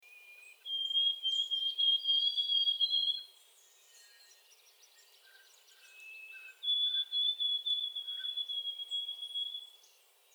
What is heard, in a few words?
White-throated Sparrow Songs